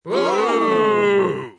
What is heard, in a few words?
Oooh